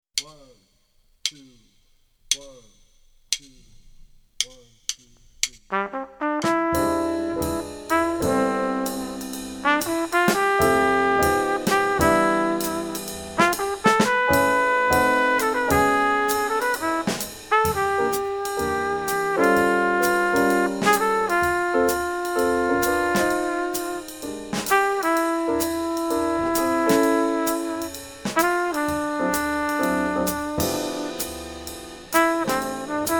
minus bass; count off sets up the melody; play figures for the melody; walk for the trumpet solo 2 choruses

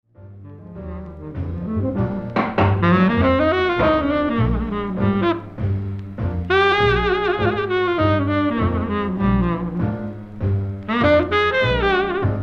Tenor Solo

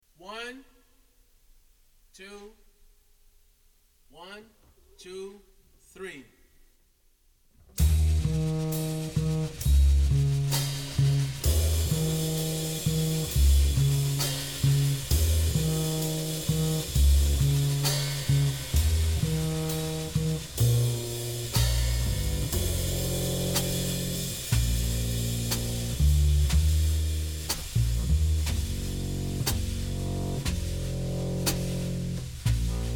bass drums only

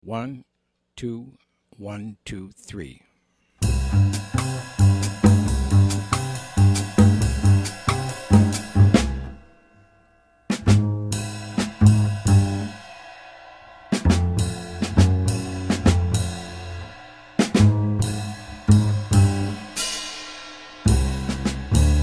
Bass Drums only

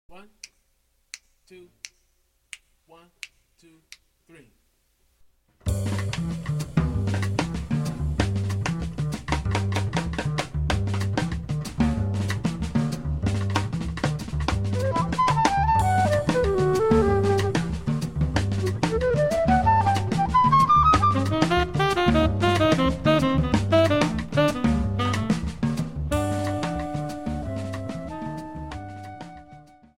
minus piano

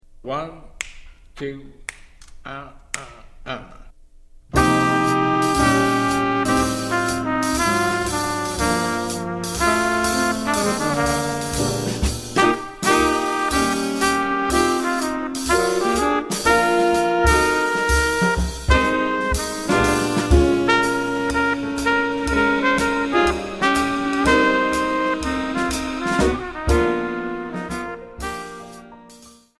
no bass

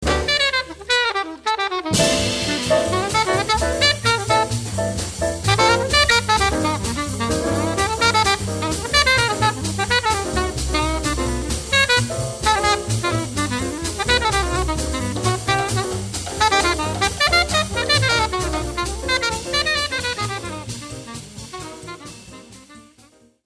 alto solo

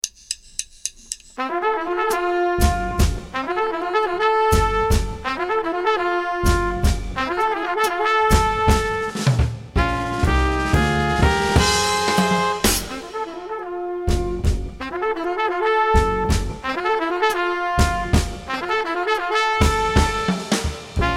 minus Piano

